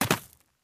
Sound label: land soft gravel; Ben 10 - Escape Route